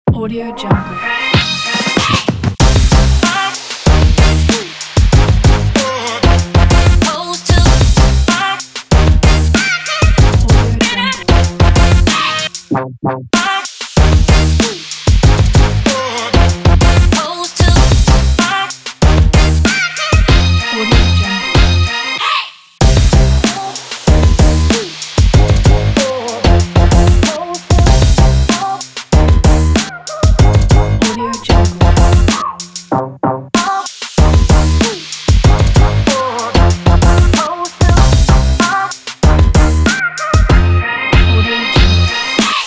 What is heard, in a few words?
hiphop